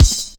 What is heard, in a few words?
Kick, Virtual Kicks; Zero-G Jungle Warfare 1, 2 & 3; Percussion Hits; Drum Loop Hits